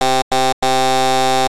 Controller Test Cartridge - Unused Jingle

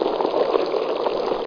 SLIPPING; Frogger 1997

